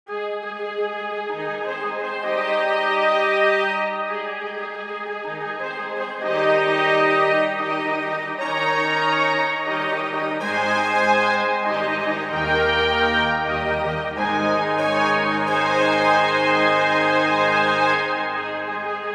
Fanfare Organteq

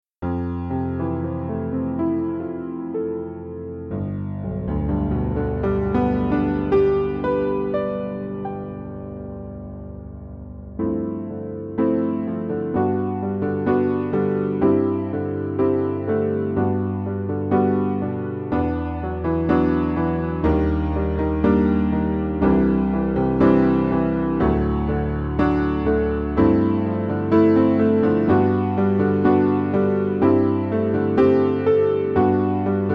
Dark Bar Cleaner Rock Ballad

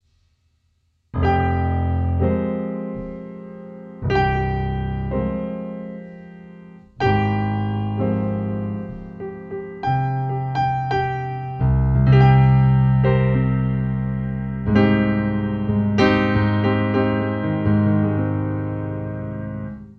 Piano loop tape Porta Cassette; Studio One

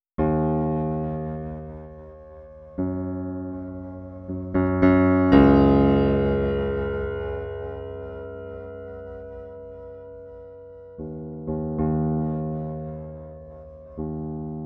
59 notes, 80 seconds, pianoteq

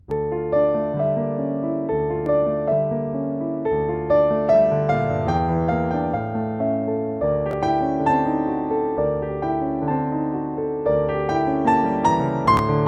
Steinway D; A4

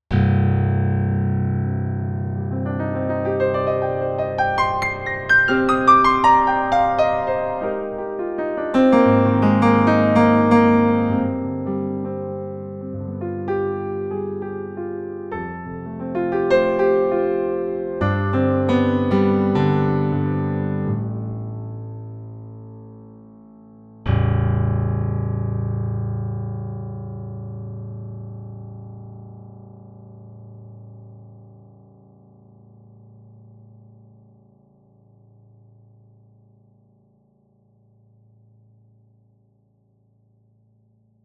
Bosendorfer 290 Imperial

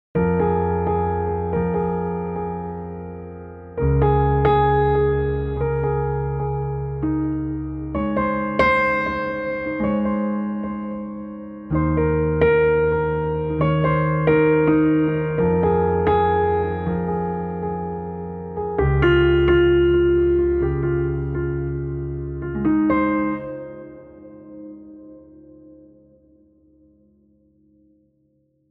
NY Steinway Model D

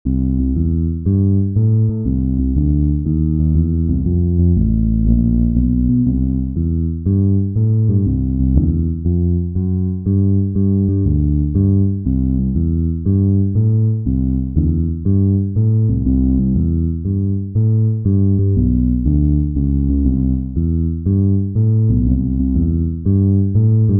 Fretless Bass

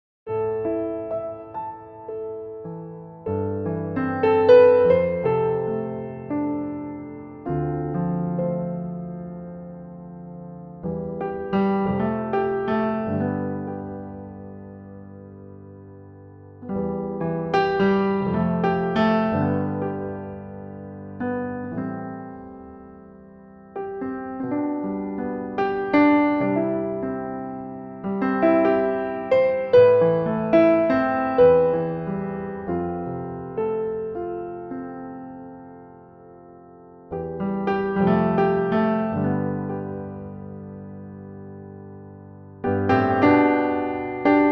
Model B test improv

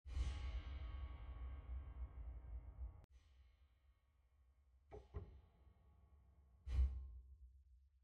dampfer

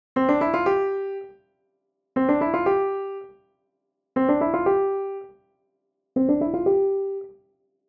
U4 soft pedal

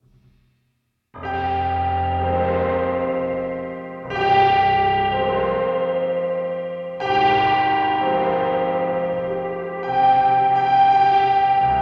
Piano loop tape multi FX; Personal multi FX A

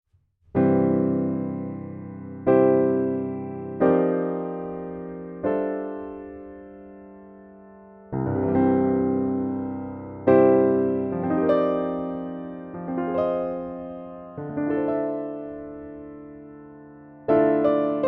PianoTeq Model B Close Mic

